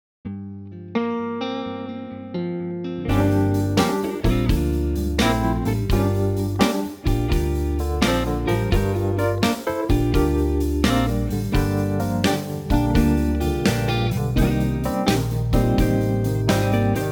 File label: blues